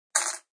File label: Coin toss into a bowl; Clinks